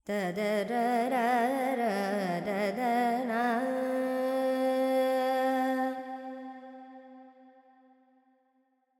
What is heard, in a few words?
FX Lydian, Vocals, Carnatic Female, Alaps; 70 G; Sample Banks